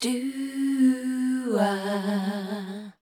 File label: MEL C, Voice Spectral